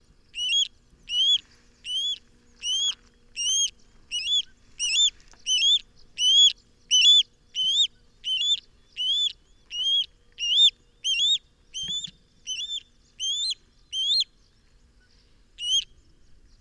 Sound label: Osprey